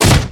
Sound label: jump